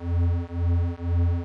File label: Loop Siren; ambience